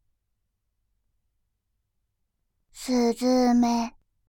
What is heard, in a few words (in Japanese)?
スズメ; バイノーラル右